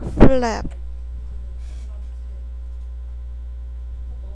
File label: flap